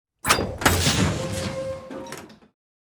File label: Sci-fi space door robotic latch electronic